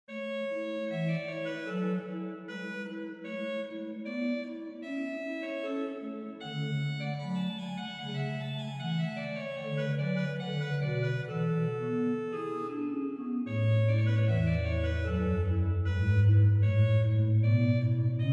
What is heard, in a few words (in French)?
Gloria Recit Cromorne, OrganMusic; Beauvarlet Charpentier Journal; Souvigny